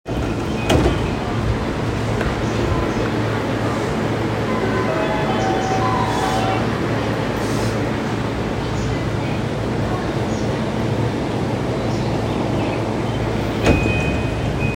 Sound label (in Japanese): 横浜線 八王子みなみ野 北, train sounds